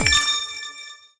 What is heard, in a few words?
Bite; OvertakingLegend